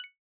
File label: score bonus